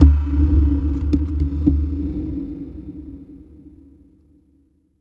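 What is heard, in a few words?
taiko guitar drum; Big Fish Audio - Alien Guitars; KINDA TAIKO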